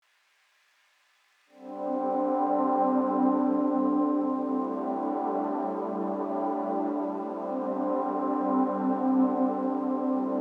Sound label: No Worries In Life Pads EPiano